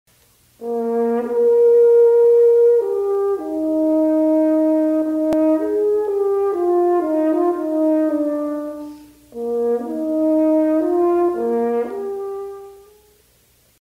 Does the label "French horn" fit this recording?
Yes